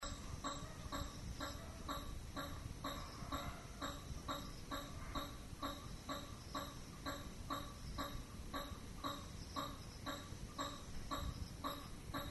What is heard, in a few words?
Hammerhead Bat